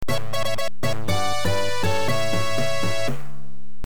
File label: 1-Up; Sonic The Hedgehog